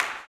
CLAP, Blues Soul; Spectrasonics Vocal Planet

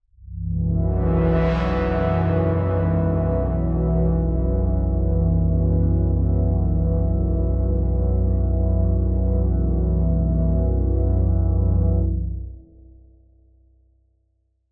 One Shot Drone E, dystopian drone; One-Shot Drones

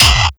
Sickly Hit, Fx; Sonic Foundry (Sony Creative Software); Esoterik Beatz

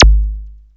zap, e-percussion, techno; Techno ID; single-drums

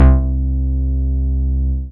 BAS MINI, Mini-Moog

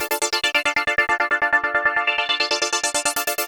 synth arp; Electrocution

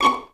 VI1PIZZ#C5, VI PIZ; Best Service; Peter Siedlaczek's Advanced Orchestra

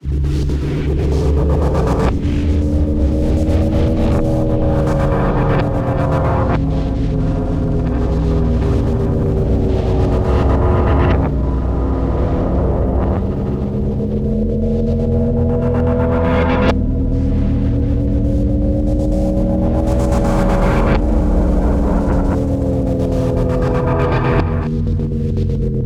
texture, drone pad texture; Polyend; ARC Noise

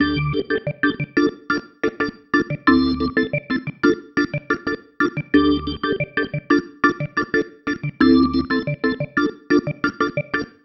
Pattern, Organ

